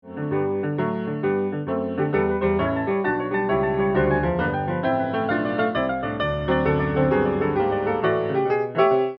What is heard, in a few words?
honkytonk, piano